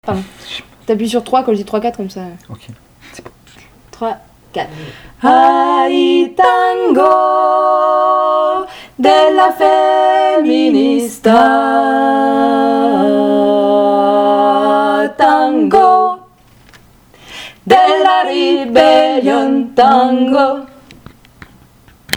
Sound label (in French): tango refrain medium aigu